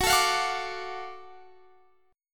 Gb Minor Major 7th Sharp 5th Gb down Guitar Standard; strummed; JGuitar